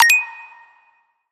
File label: succsess